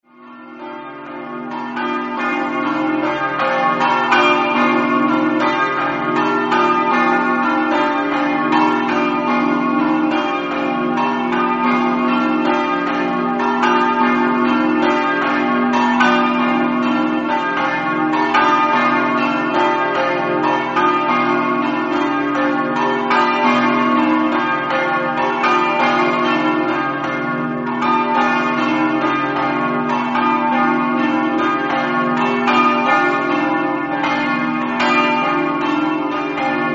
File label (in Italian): campane; meduno